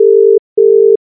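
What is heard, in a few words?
British ringing tone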